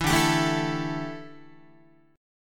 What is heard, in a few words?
D Suspended 2nd Suspended 4th D down Guitar